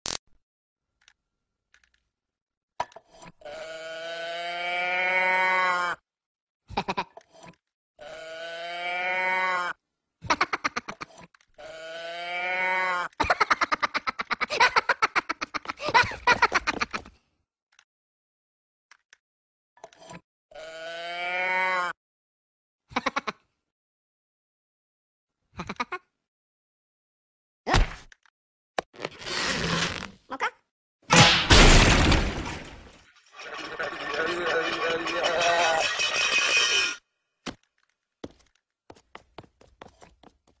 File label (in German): Kuhglocke; Minions